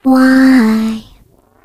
turret disabled

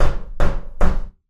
container linear knock; Source Engine; Portal 2

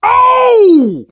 positive noise, survivor voice, manager; source engine; left 4 dead 2

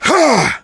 melee swing, player survivor voice

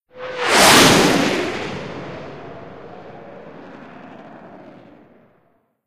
jet by; Left 4 Dead 2; Source Engine; jets

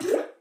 paint trickle

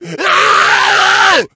become enraged, infected, male; npc; alert; Left 4 Dead 2; Source Engine

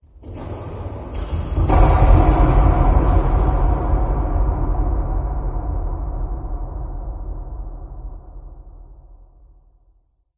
amb rock fall, ambient organic; video games; source engine; portal2

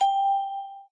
ding, windows xp; operating systems

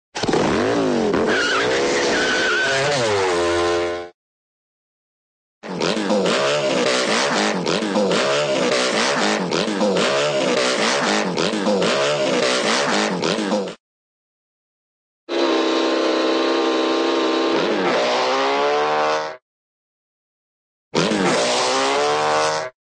motorcycle start and peel by, auto; cartoon

